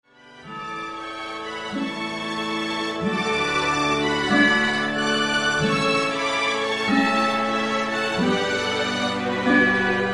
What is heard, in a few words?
Looooooooooooop